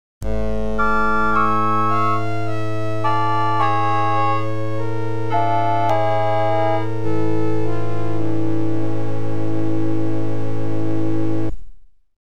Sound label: OPL2; X WING